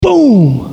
Excitement, Vocals, bowl

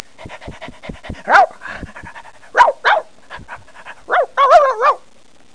rip dog yip; Greatest Stories

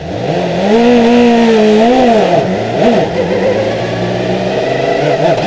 START

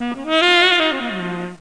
Sax; Macworld (1998-10); Serious Demos; Morpha; 3DO

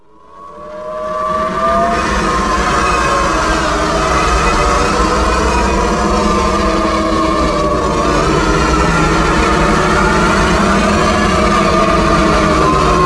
levitate; PC Gamer IT CD 49; UrbanChaos

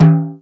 craft failure; DAoC

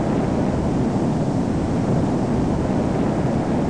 wind; a-10cuba

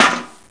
clang; Gunlok; Robots

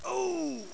SCREAM

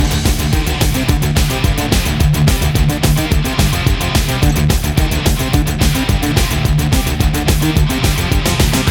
loop; Digital Culture Stream - Volume 4A; Trust

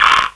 BIRD; BERMUDA

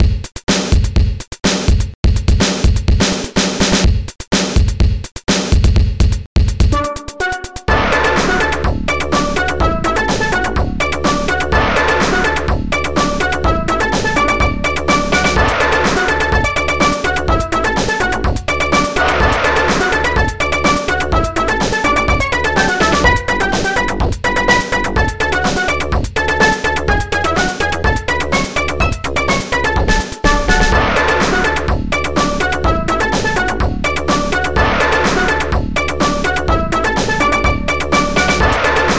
ALOHA

